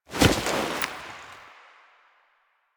Foley; Finisher; Warframe; Kuva Lich; Finishers

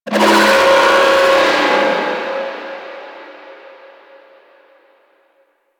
Grineer Space Pod Warning Call, Vehicles; Warframe

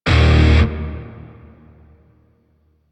Shawzin Blues Chord B; Grineer; DE; Warframe

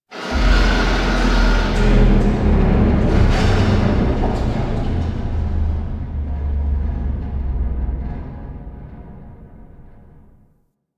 Vault Heart Beat Broken Creak; Ambience; Warframe; Infested Eris

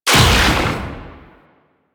Artillery Barrage Shot; Weapons; Powers; Warframe; Archwing

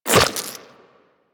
Step Goo, Footsteps Walk; DE; Warframe; Tenno

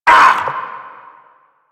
Vocal Pain; Warframe; Duviri; Dax; Vocal Set A

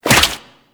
Infested Tendril Step, movement

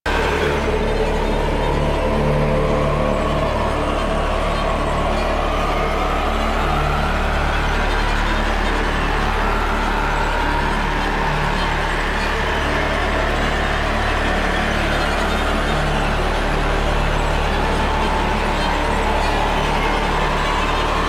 Climax Cue, Music; Warframe; DE; Entrati; TennoCon2023

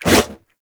Sword Swipe, Whooshes; Weapons; Melee; Warframe; Pacifist Sword And Board

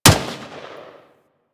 M16 Fire, rifle; Wraith

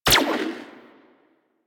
Shot Fire, gun; Warframe; Tenno; Tommy Gun; Stradavar Prime

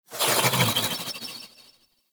Decoy Arrow Whiz By; Powers; Fey; Saboteur

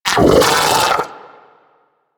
Arachnoid Vocal Attack; Enemies; Vocals; Warframe; Corpus; Arachnoid Hunger

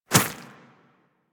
Infested Small Walk Dirt, Footsteps; Warframe

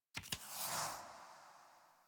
UI Collectible Tarot Card Slide In; Warframe

